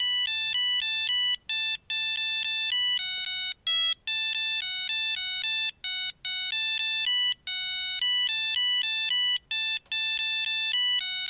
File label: sony t68i